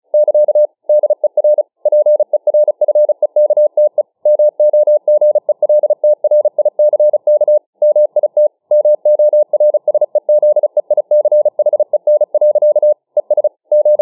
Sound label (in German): Morsemogeln 35WpM